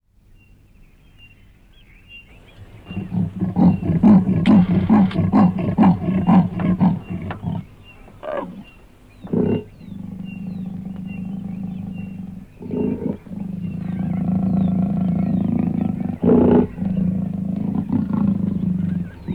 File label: Leopard